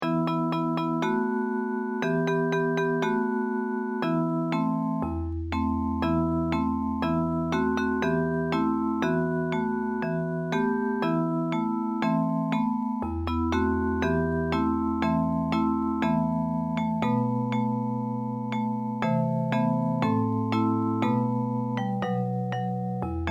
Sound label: japanese folk song; obaba